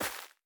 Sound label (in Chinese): 垂根踩踏声